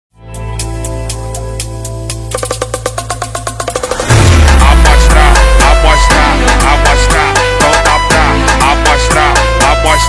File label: FUNK SPED UP